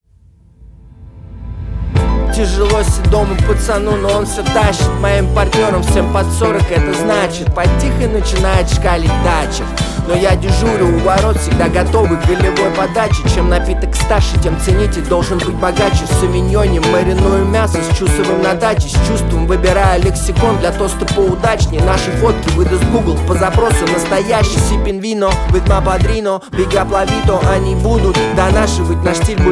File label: funk